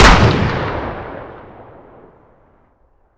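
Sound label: weapon dmr fire player atmo ext; gmod; viper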